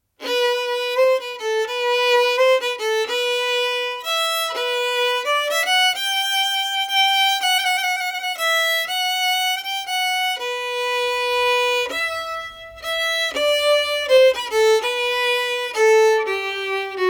boda waltz faster more ornaments, fiddle

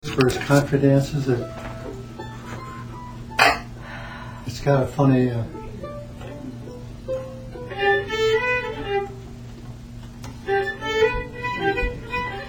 fairy princess reel, fiddler; fairy princess reel as played by fiddler randy kerr

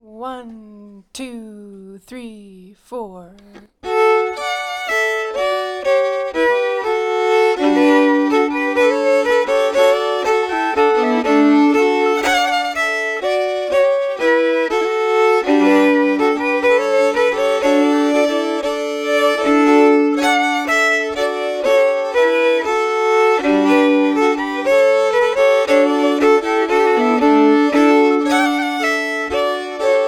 unsetholmen ganglat melody plus harmony, fiddle

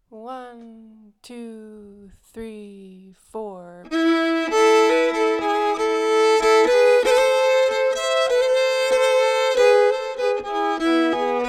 andakten melody plus harmony, fiddle

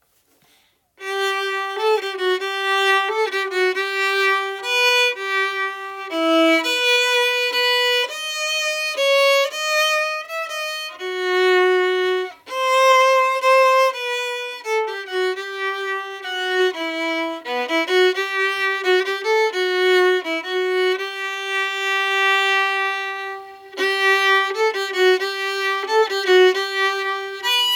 boda waltz harmony, fiddle

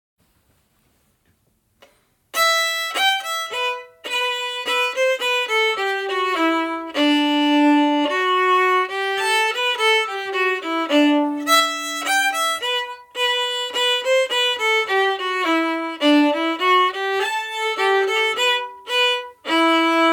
cat out of the bag slow minimal bowing, fiddle

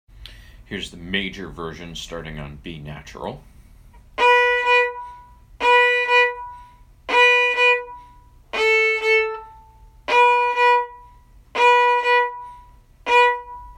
kitchen jig groove 3rds, fiddle

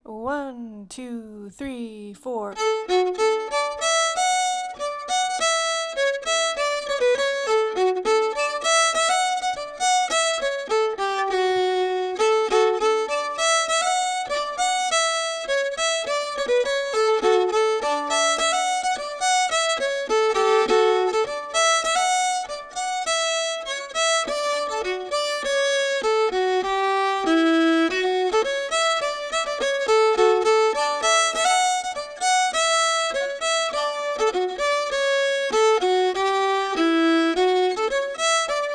mistwold harmony, fiddle

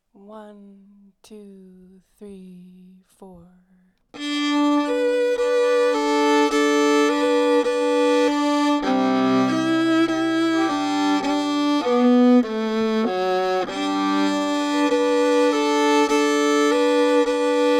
prayerful hymn in g, fiddle

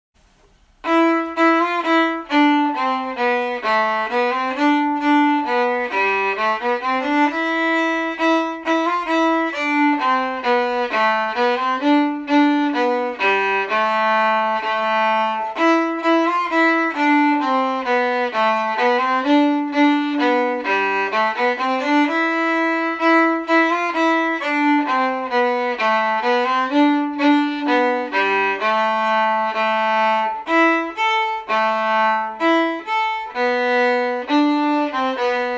an dro in am low octave, fiddle

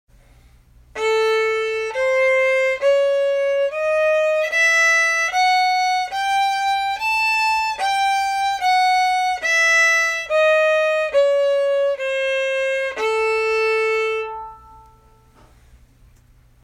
sirba scale, fiddle

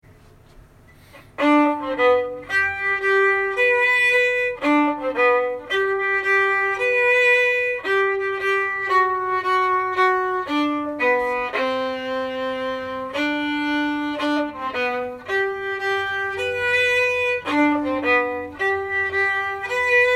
tombigbee harmony, fiddle; tombigbee waltz

